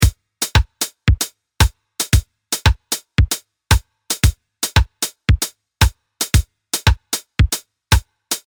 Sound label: hemiola poly